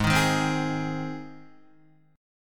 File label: Ab Major Ab down Guitar; strummed; JGuitar